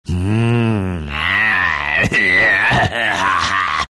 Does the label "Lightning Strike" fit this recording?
No